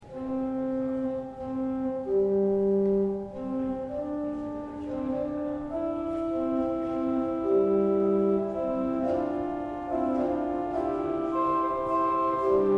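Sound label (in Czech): mechanický nástroj z roku 1716, dva manuály a 13 rejstříků; V 19. století byl zromantizován; Johanna Leopolda Burkarta dle návrhu M. Vogta; Manětín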